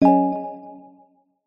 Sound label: multimedia notification mallet synth dreamy